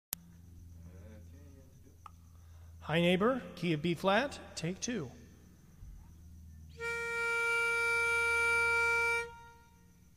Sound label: Lead